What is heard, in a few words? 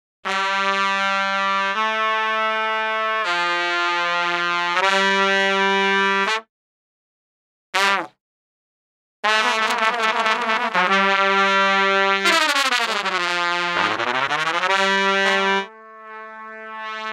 Trpt Art